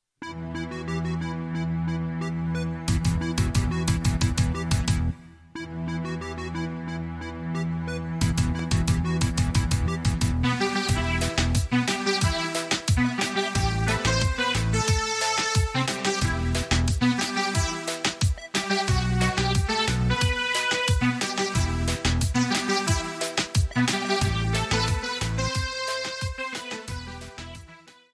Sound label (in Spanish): Reggaeton, ritmos